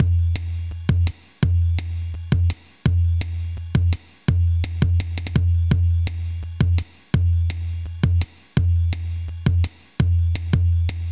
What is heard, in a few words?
Drum & Bass